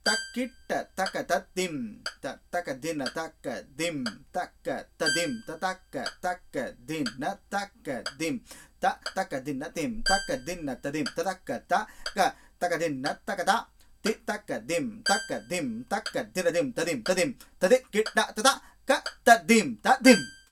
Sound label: changing gati 4 5 to 6 5